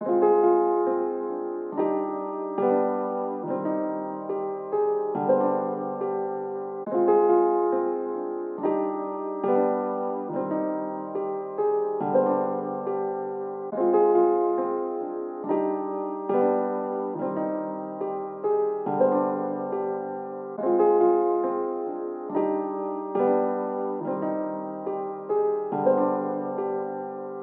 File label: 140 BPM C Min Piano, loop stems; Melody; Cymatics; 2022 Melody Collection Platinum Expansion; Bloodborne